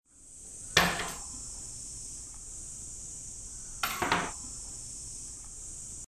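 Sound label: Terumo saisenbako, offering box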